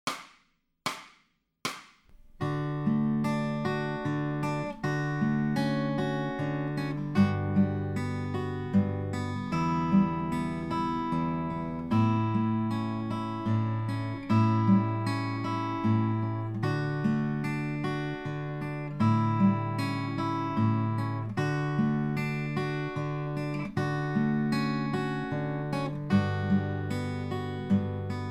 Fingerstyle, acoustic guitar; Grade 2

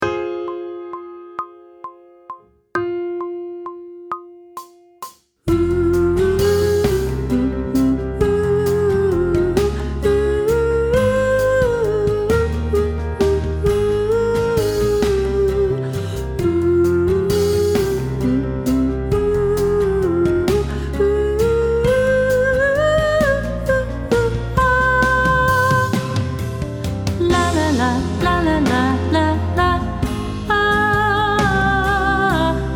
Sight Singing (with vocal); pop vocals; grade 6

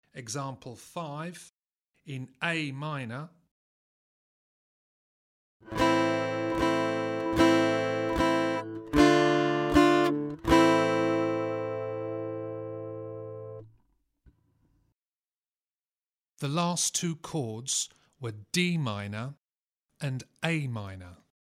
Aural Harmony Test, acoustic guitar; Grade 5

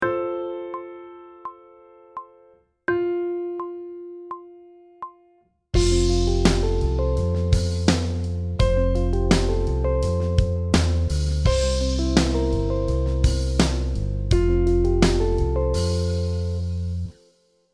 Sight Singing backing track; pop vocals; Grade 1

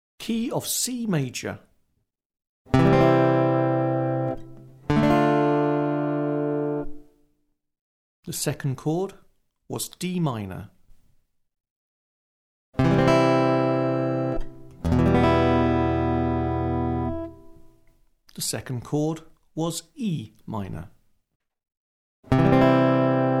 Aural C Major, acoustic guitar; Grade 6

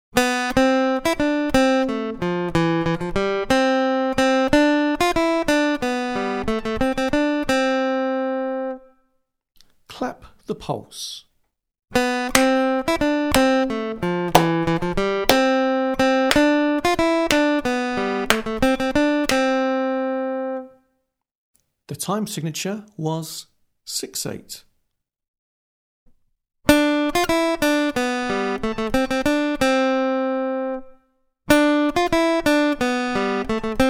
Aural Rhythm Test, acoustic guitar; grade 6

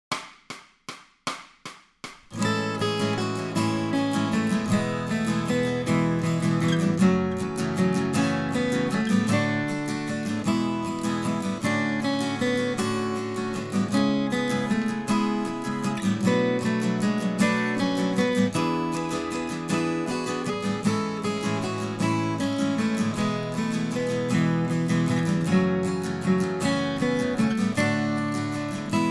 acoustic guitar, accompaniment; Grade 6

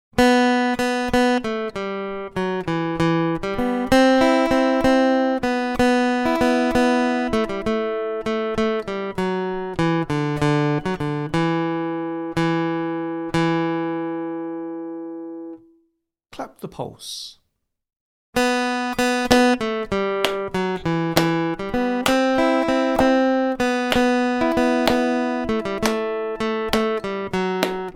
Aural Rhythm Test, acoustic guitar; grade 7

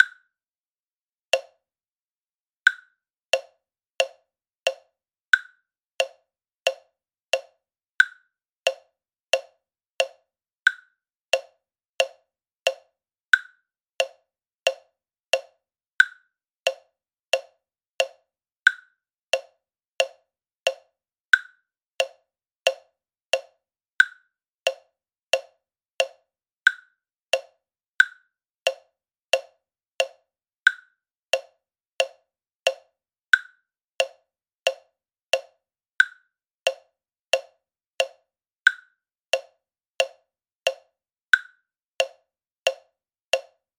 Click Study Feeling Blue Slower, drum kit

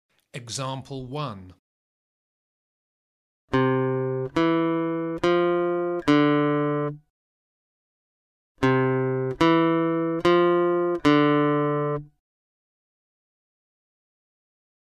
Grade 2 Aural Pitch Test, acoustic guitar

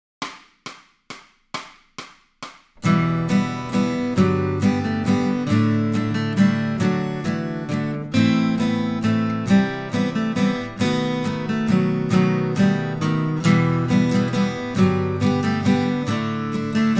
acoustic guitar, accompaniment; Grade 3

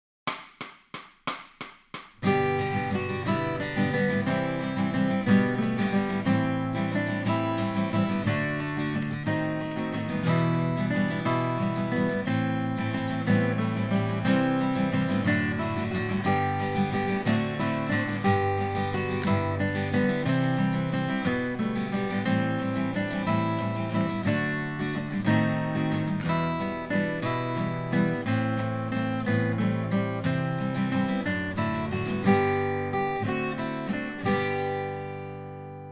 acoustic guitar, accompaniment; grade 7

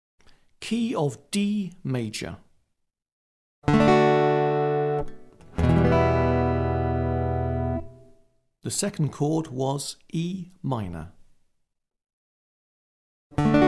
Aural D Major, acoustic guitar; grade 6

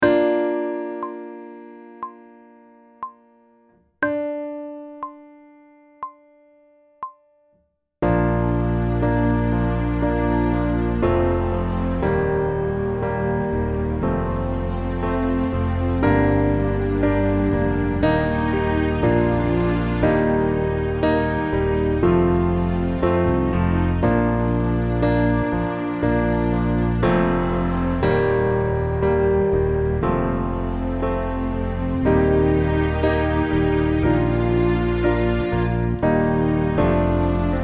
Sight Singing backing track; pop vocals; grade 5

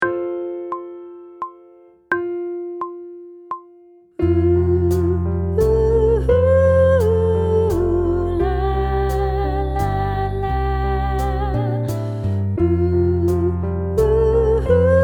Sight Singing (with vocal); grade 2; pop vocals

